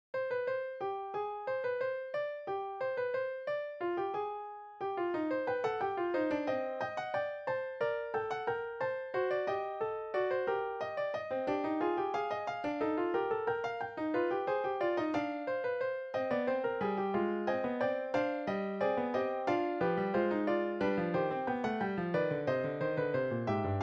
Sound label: Mild Mannered Clavier; ringtones; Johann Sebastian